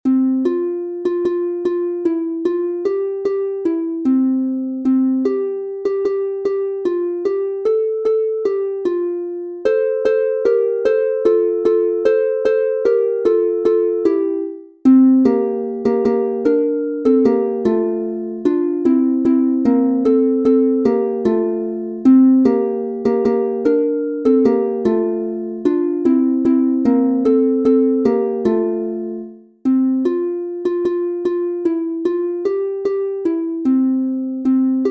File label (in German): F-Dur 2 st instr, Harfe; Wenn i amal heirat